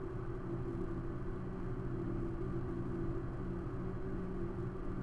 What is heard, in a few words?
room tone heavy; Star Wars Obi-Wan; XBOX